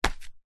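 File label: foley sandal flip flop single hard footstep on hard ground; zapsplat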